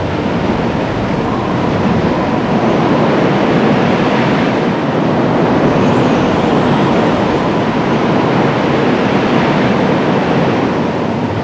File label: Tree Wind Loop